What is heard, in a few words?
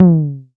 Tom MKS, Drums